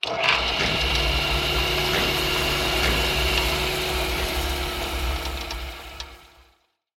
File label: old motor; soundscrate